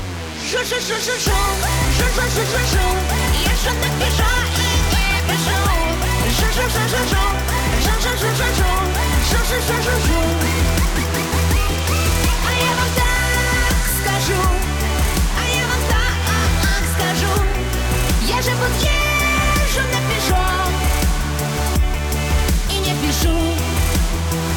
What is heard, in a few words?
Loop; Beha